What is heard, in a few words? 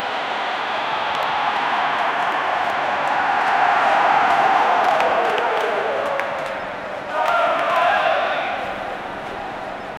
stadium on a football mat